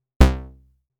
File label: Short, synthlead